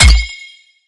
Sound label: Item active reload window entered